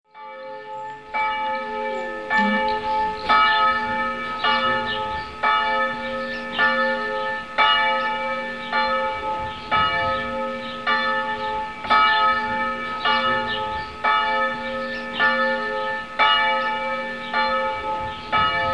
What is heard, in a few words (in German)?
glocken; rumes